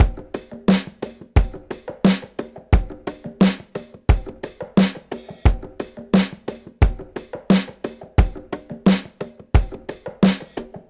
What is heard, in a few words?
Funk (Straight bassless)